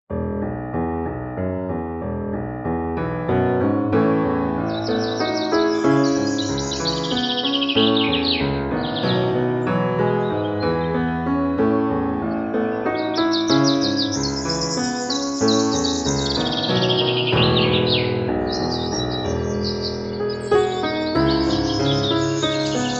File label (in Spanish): Piano y Canto de Aves en Armonía